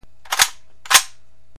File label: rifle cock; weapons